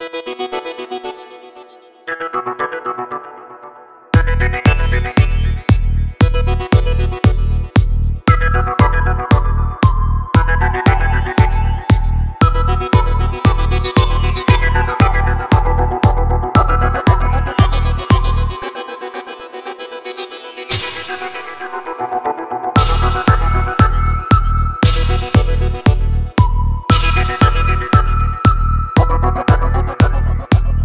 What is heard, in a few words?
trance of folklore